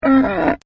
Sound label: Camel Voice; Camels; Partners In Rhyme